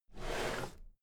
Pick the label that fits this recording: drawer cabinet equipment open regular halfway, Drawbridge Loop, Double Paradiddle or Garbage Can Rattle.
drawer cabinet equipment open regular halfway